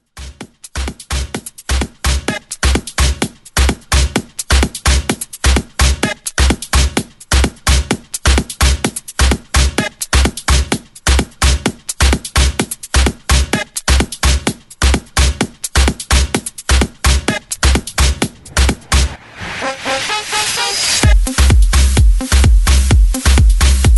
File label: Bootleg Remix 128Bpm; DJDOLLS